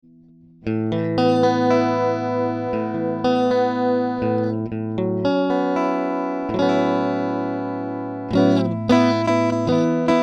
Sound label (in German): E Git. Flair; goldmike mk2